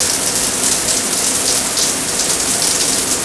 shower running; toilet; Max Payne

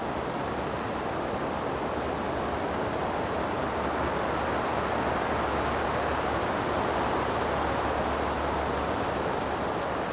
wind; The Elder Scrolls III Morrowind GOTY; XBOX

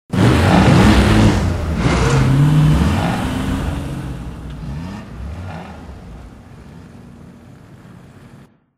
Rev LM plus sport; Cars; XBOX

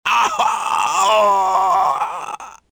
writhe, pain death, male

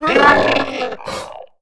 two head dead; Star Wars Knights Of The Old Republic I; XBOX

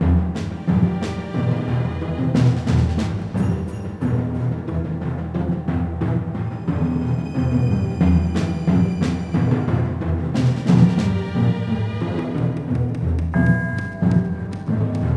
sneak level; Sid Meiers Pirates; XBOX

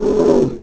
roar

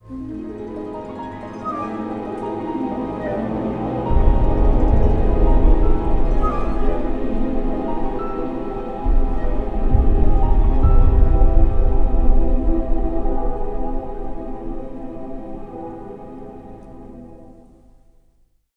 suspense, music

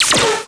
laser droid; weapons

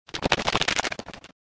scuttle, mine

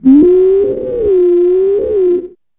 Call, Dino

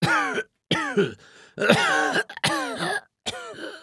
walk

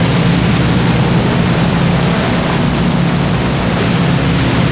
ferry engine loop; Tony Hawk's Project 8; XBOX

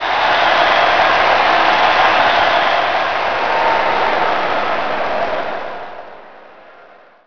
big crowd; MX2002 Featuring Ricky Carmichael

